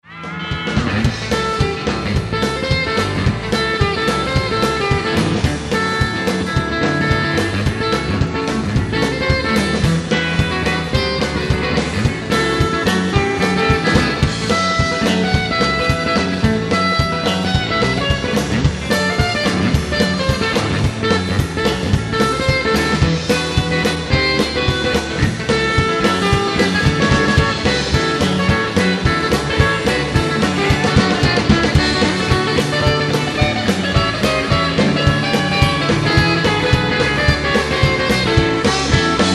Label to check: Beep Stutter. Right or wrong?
Wrong